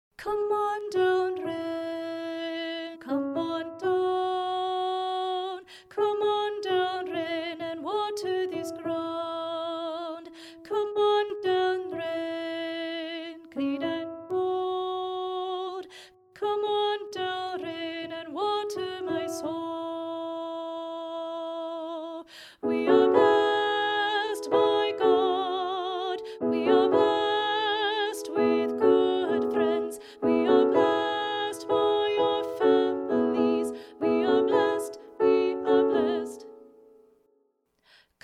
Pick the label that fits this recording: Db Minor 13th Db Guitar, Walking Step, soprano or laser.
soprano